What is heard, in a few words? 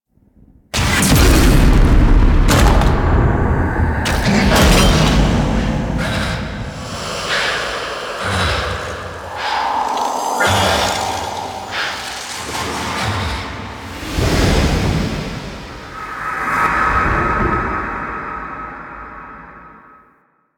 Hit Falls; Cinematics; Erra; Warframe; The New War; Throne Room